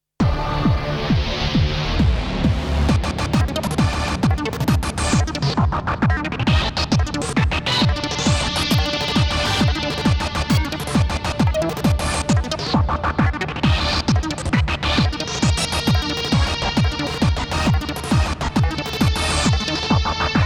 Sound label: KORG Wavestate MkII Mayhem Machine Factory Sound